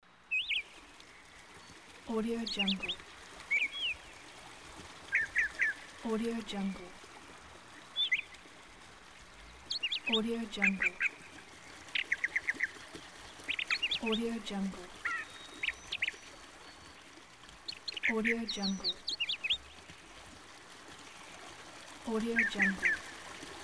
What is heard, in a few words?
spring stream